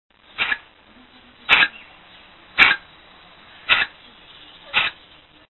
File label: strix aluco